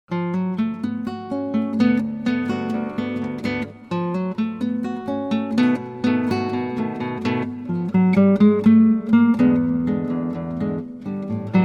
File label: Guitarra; Gitaarboek